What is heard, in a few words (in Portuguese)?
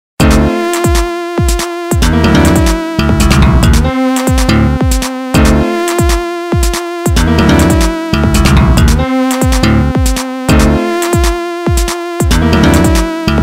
baixo